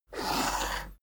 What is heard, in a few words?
poker chip slide table